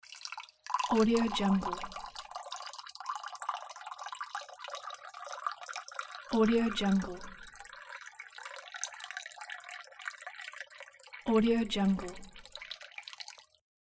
pouring water sound; vakanuvis

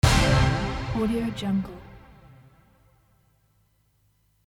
quiz show

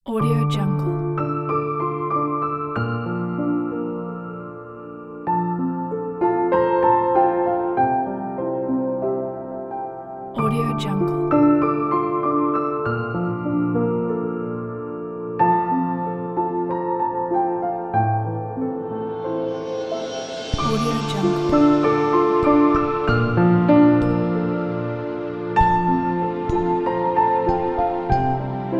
ethereal meditative piano

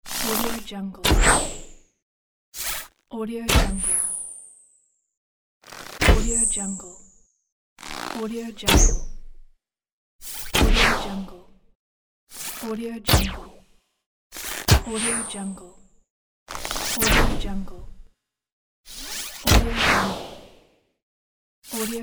bow

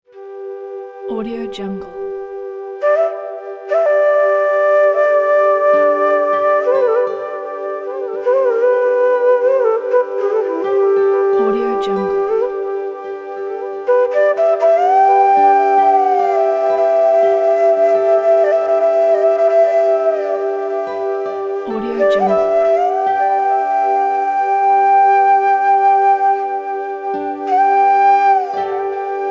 meditative flute

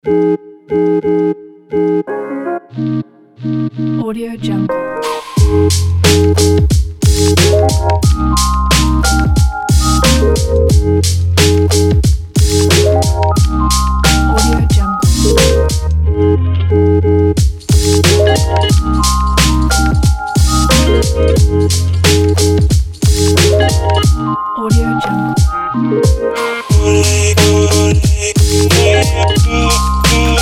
summer chill hip hop kit

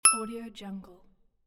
coin collected

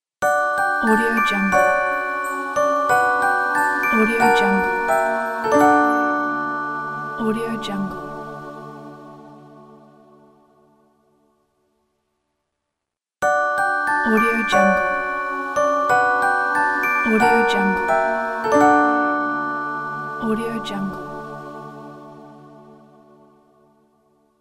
christmas ident

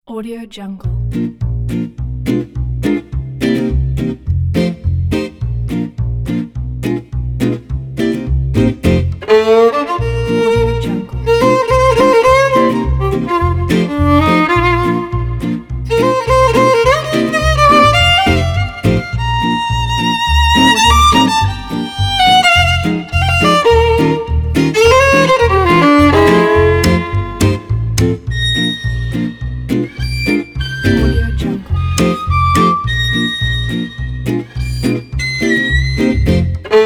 french guitar and violin jazz; eddiehoncha

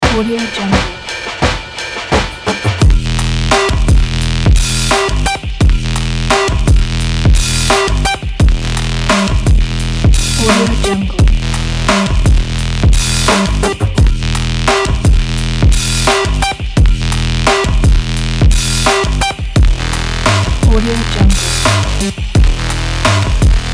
stylish sport dirty trap

